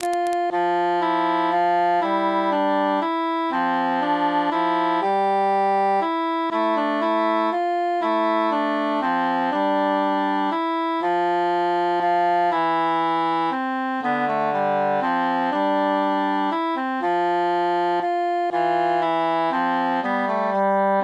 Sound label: altus mute; post partum virgo ave regina veritatem